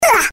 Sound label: hurt range; ZombieBattle